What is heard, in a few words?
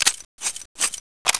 usp detach silencer